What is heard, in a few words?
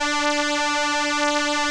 ELKA, String Machines; ELKA RHAP